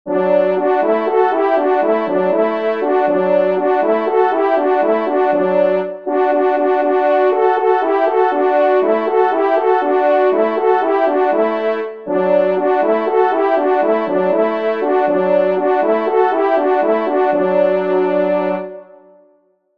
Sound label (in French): Loup Duo, trompes